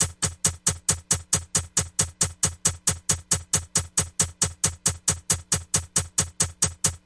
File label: Ambient House Hats 136BPM